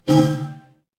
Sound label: feedback achievement